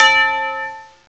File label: BELL, BELLS; CMI Sound Library Rev 1.3